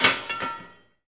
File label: axe; kult